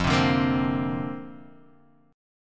F 6th Suspended 4th F, chord; F64 strummed

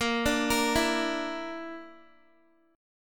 A sharp Major Flat 5th, Guitar; A#Mb5; arpeggiated; x,13,14,15,16,x

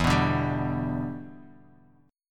E Minor 7th Bass Standard; strummed

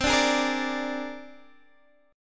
C 7th Suspended 2nd Sharp 5th, Guitar, Open D

